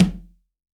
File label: CONGA, STEREO CONGA